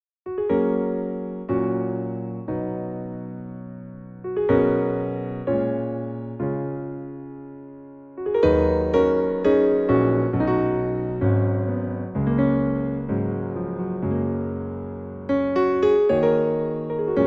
battery park blues

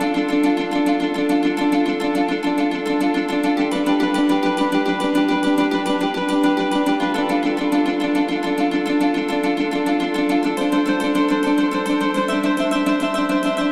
guitar loop d 140 bpm

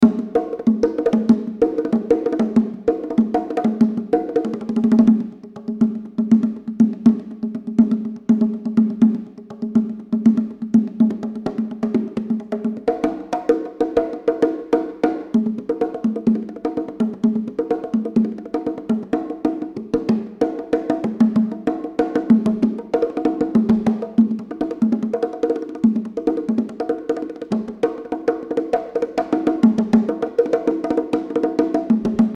beat

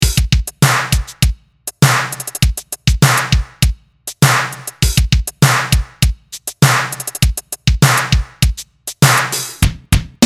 synthwave

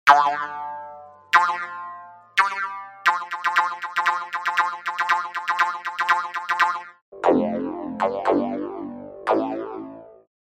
indian morsing mukharshank

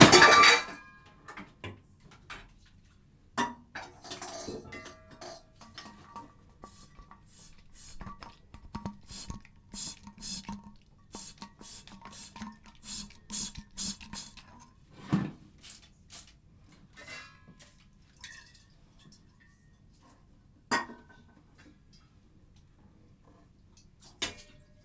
clang

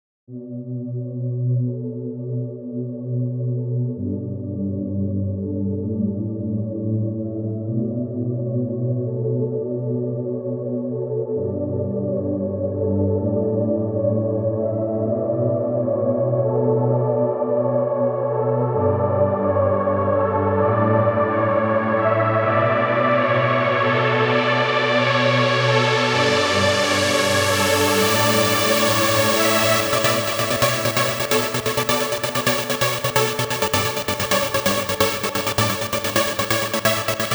gated trance pad with velocity

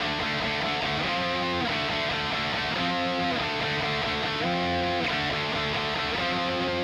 loop d 140bpm

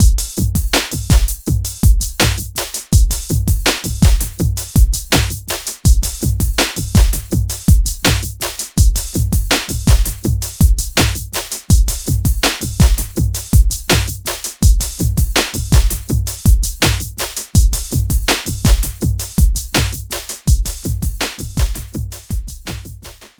elec drums inversions

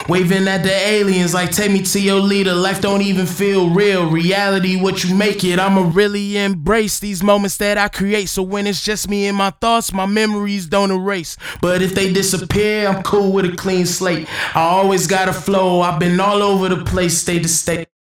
rap vocal

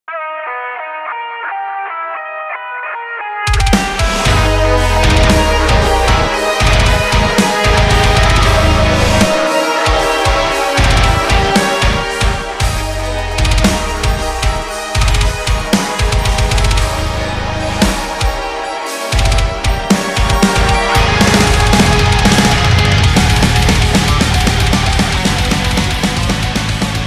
buried side drum

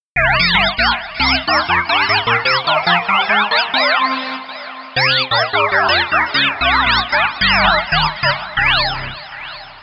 resonance power

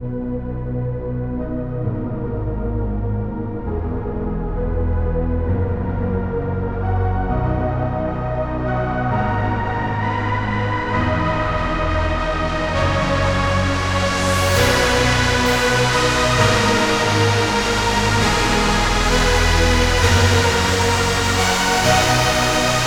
trance pads

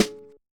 bellboy snare; nova sound

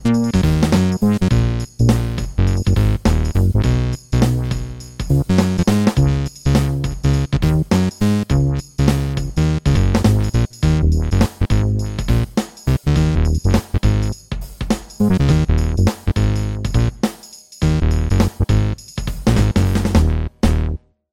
bass gritty blur

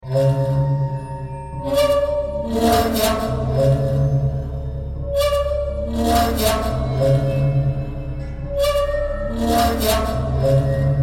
strident lullaby

